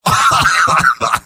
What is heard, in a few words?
laugh short; engineer; video games; source engine; tf; mvm